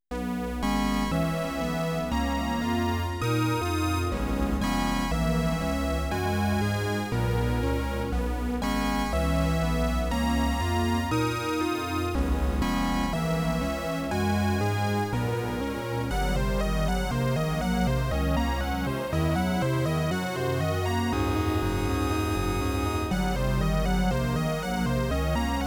xenharmonic mystery tuning

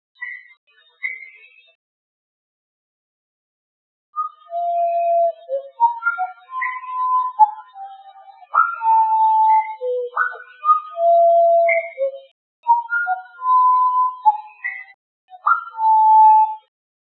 Wren detail silenced half speed; Sjoerd Mayer; pitch tracer

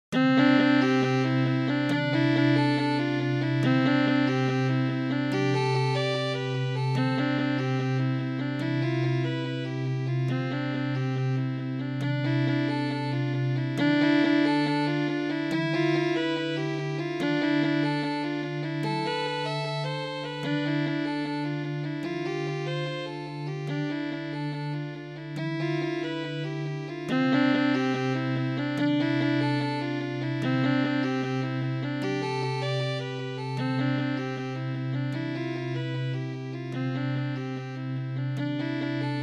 fiddle and melodic tom; fractal music

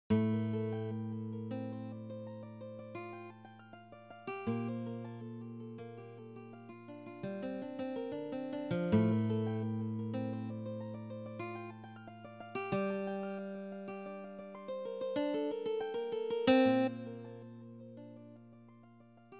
guitar divisions

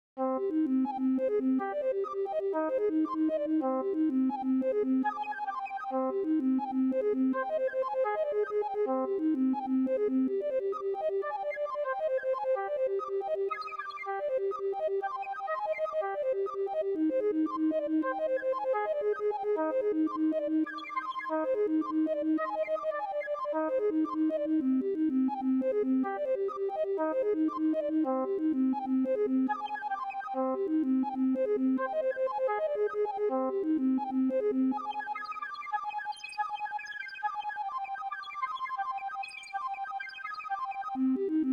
pentatonic bird song; fractal music

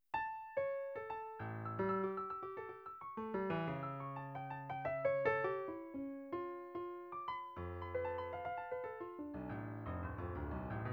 microtonal sea scape piano romance; tunesmithy

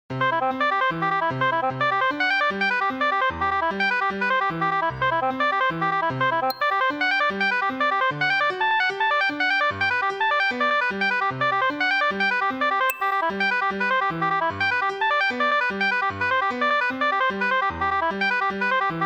oboe and friends; fractal music

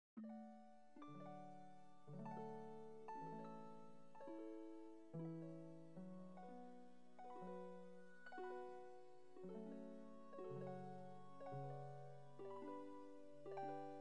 canon chords; Pachelbel

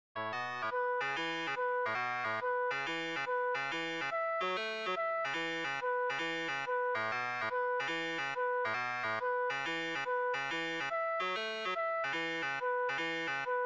bassoon prime harmonics

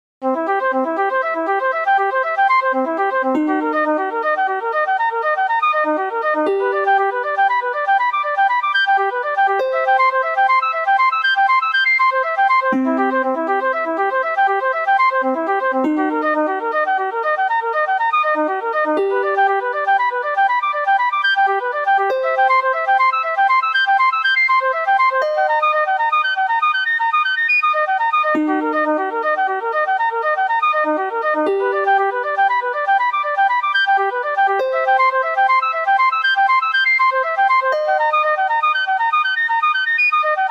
harp bluesy minor flute major; fractal music

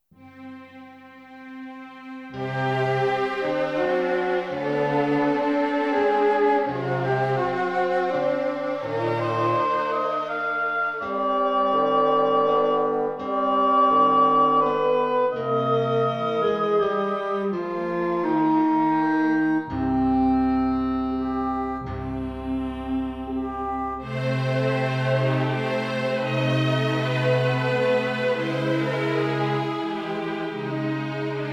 15tet

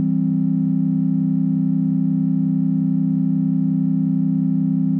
12 et triad on -800 cents

